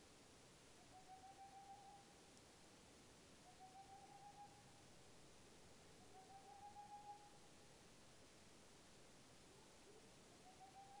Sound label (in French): Chouette de Tengmalm; biophonia; suivi avifaune